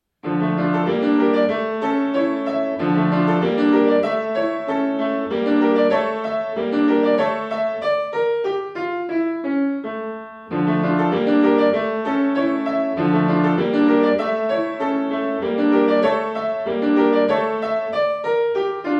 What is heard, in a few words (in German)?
Dreiklaenge, klavier; Hook